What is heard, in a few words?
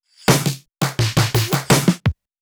fill, fills